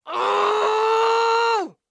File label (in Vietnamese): tieng nguoi phu nu ran gong len; the sound of a woman running gong len